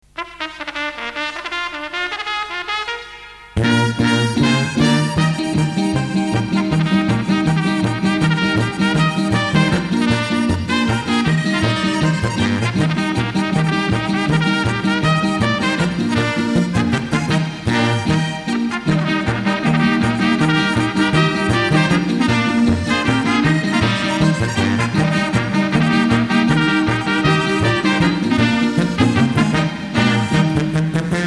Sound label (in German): Lustige Trompete